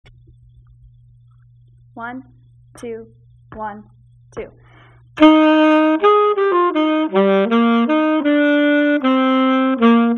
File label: duet xii part 2